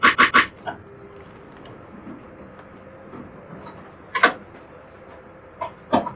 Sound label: door opening